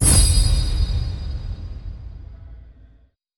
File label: medium win; netent